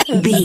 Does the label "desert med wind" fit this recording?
No